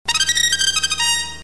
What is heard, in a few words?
trumpet